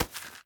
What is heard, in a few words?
Match Box Pickup; Devour; The Town; devour 2021 gamerip pc macos